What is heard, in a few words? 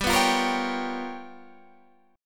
G 7th Sharp 9th Flat 5th